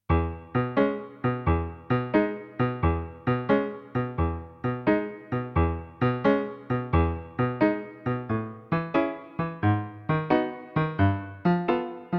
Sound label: karaoke